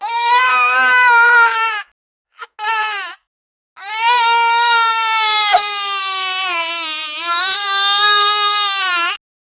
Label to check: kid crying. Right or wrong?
Right